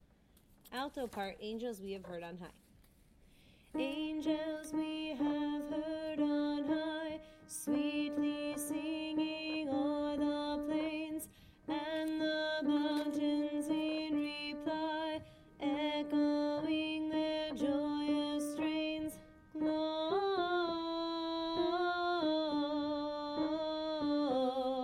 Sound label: Angels Alto